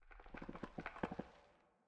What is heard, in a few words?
pale hanging moss; minecraft 1.21.5; block